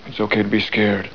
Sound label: Scared; McQueen; "Its ok to be scared"